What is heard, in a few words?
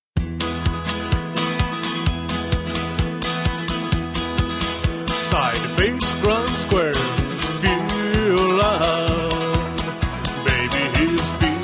pure love vocal